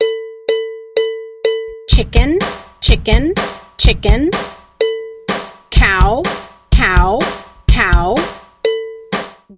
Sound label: Farm Chant